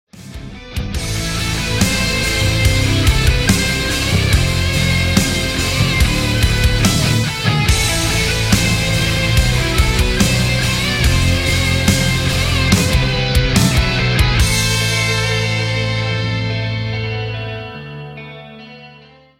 instrumental

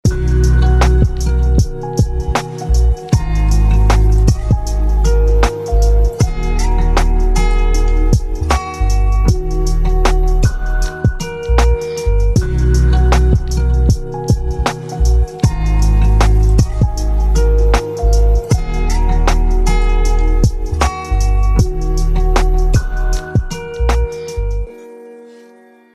instrumental

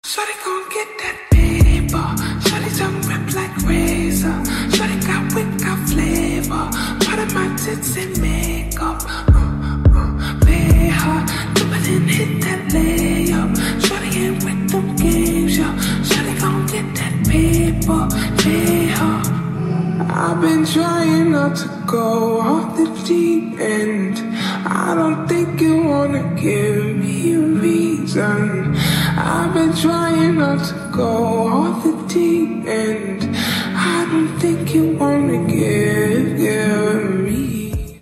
slowed reverb

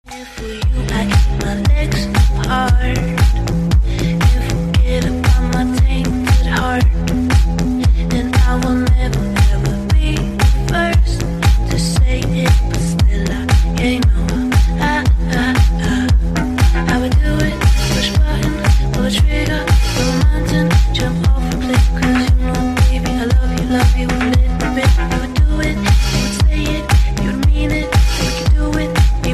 slowed reverb

